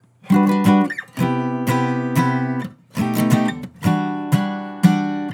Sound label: Acoustic Chord Loop - 180 BPM E Min; Cymatics